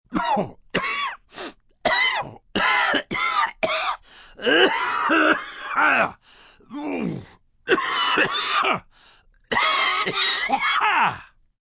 Cough Hacking, Cough, male, sick, cold, wheezing, sick (human voice); Clearing Throat; Sound Effects:Human; Voice Box